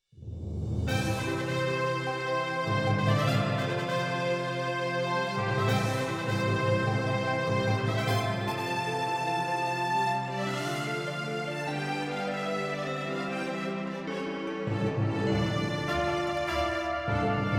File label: FANFARE